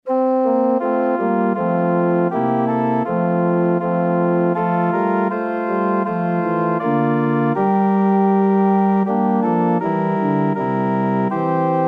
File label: organ